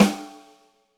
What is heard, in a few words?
Snare, Roland TD-20